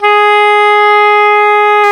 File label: oboe, Oboe Brth